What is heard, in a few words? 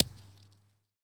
Baseball Bounce Dirt